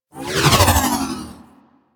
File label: Ball Projectile By; Missions; Tenno Ball